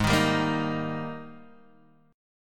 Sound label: G sharp Major 7th Suspended 2nd, Guitar, Half Step Down